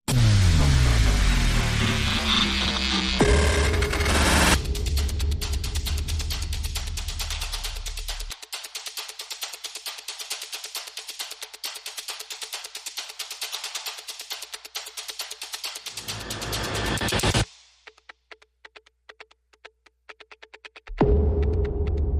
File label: Perc and FX Only